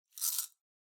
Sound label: Pocket Watch Chain Noise